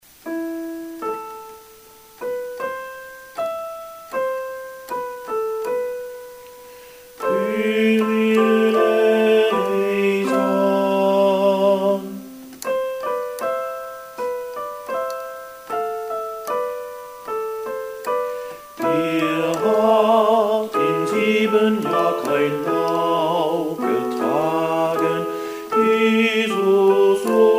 maria bass

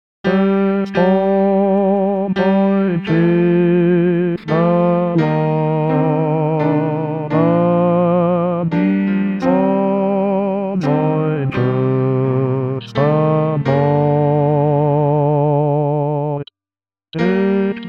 bass

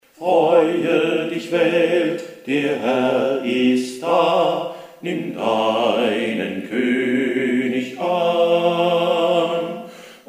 bass; FreueDichWelt

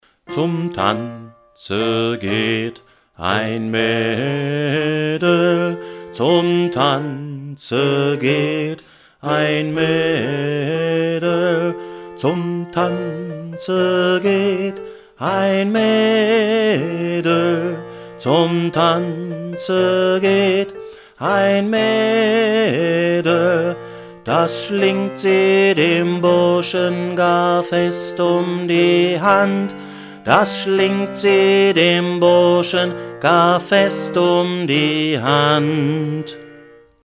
tanze bass

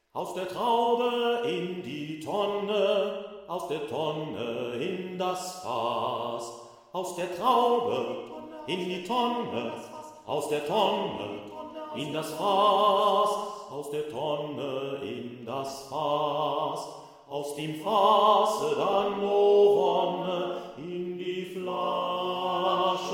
bass

